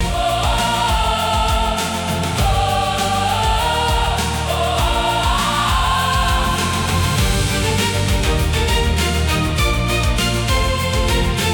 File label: loop